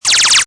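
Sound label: REELSET STOP; laser; MOBICLIC 65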